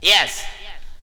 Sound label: VOCALS; Ueberschall; Jam Box